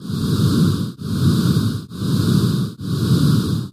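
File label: alien breath loop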